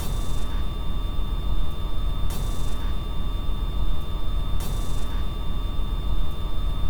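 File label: machine room ambience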